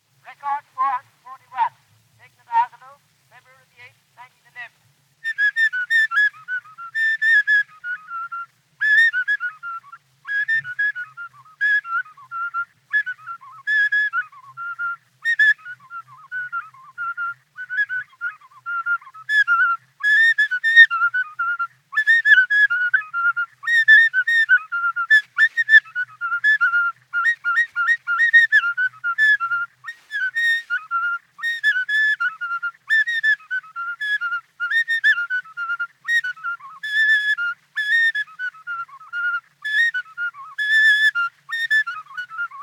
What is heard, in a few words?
flute recording; Northcote Thomas; Awgulu 1911